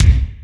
LOW BD, DRUMS; East West; Global Sounds

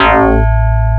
MARIMBA

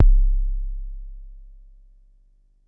Bassdrum, Jomox Xbase09

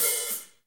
HH HH

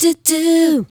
Di Dooh 120-E, Vocal Lix

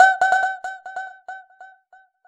Vox Hit F#3; Zero-G; Club Techno

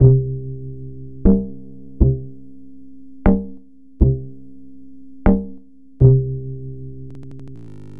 Bass; Sonic Foundry (Sony Creative Software); Twine-IDM; Basses

